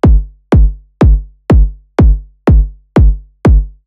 killed by drive; Tremor

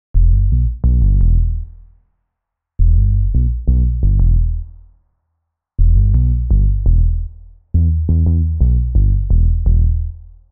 synth dry; elektra magika

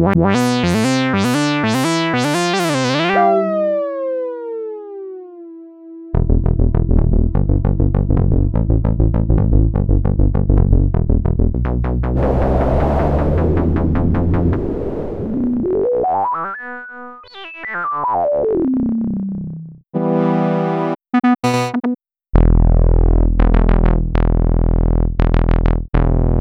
poly synth; homage poly synth 2; jwp

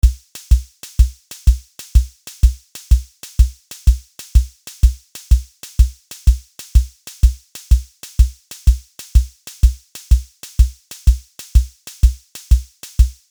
march 6 8; tiny pops; superwave

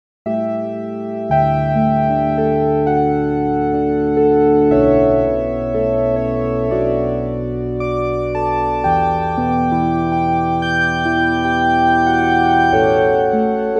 sunrise keys

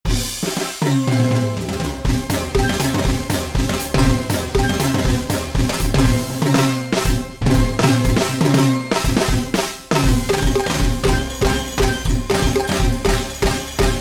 steel drums; twin pitch; audyo engineering

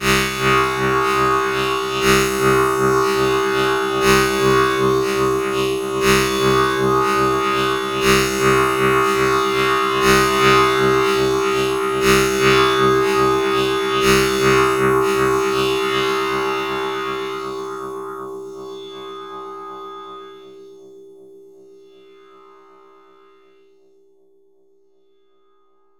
echo fm and echo mod; firefly synth 2; sjoerdvankreel

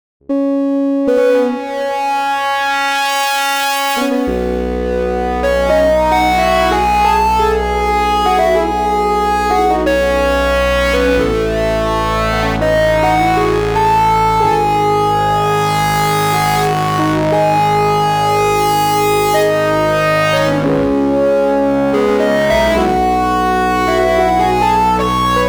synth e-guitar seq; the digital life

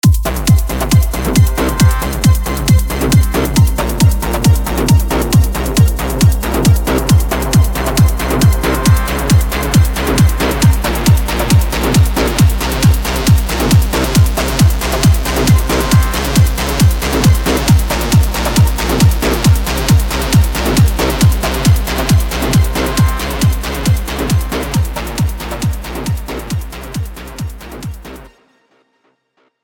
tal noisemaker hard tranc, hard trance; tal noisemaker hard trance soundpack; edmsoundware

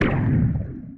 simple vaporizer vap zap laser; music and sound fx; music and sound fx master collection; nova sound

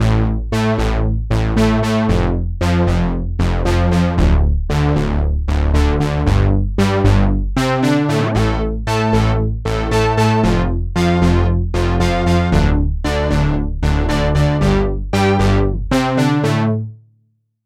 Ensemble Power Pluck octaves mod; alchemy

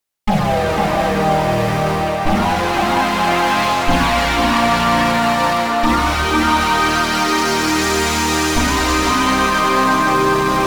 a shooting star from outer spa; the digital music

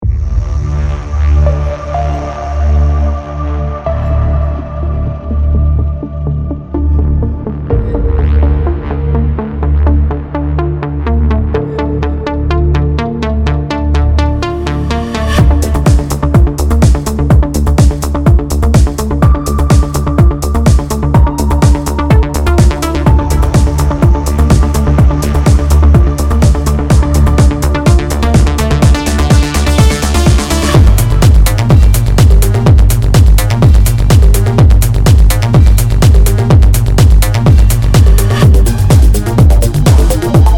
cinematic techno

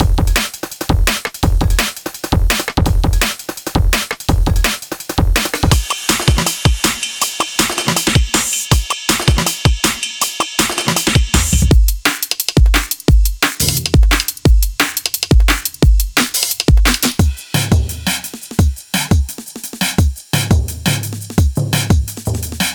dnb; triaz by wave alchemy

